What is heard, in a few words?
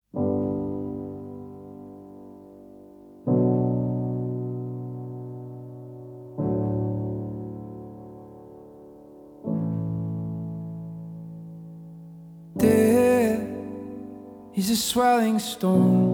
Waves - Acoustic